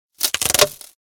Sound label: twig break